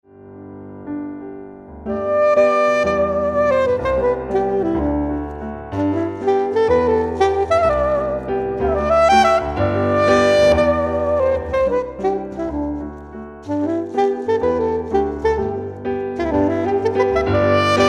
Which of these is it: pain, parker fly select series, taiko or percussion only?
taiko